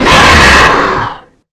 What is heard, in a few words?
jumpscare sound; trtf 5 demo